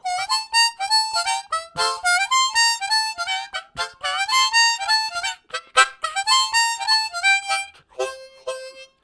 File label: High Query, Harmonica Riffs; Zero G